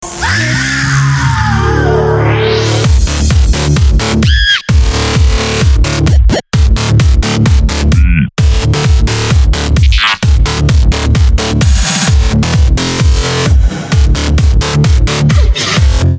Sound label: Electro, Drum and Bass dnb